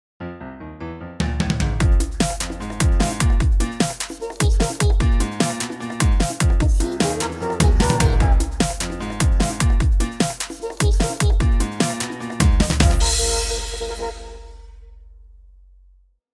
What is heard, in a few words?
chime